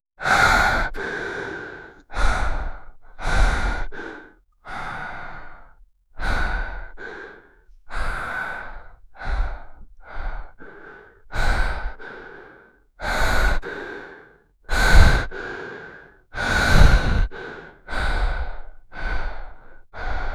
18 years old young man

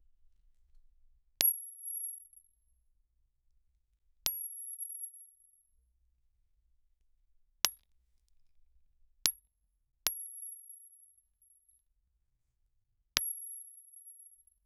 bullet impacting skull, blood

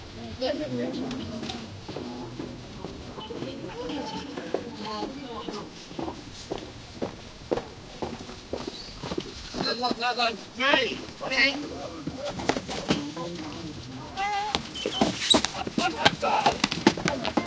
light crowd murmurs foots, bartering

